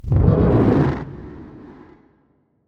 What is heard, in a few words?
ENDWORM damage